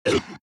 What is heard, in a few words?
Wheezy Cough; The Binding of Isaac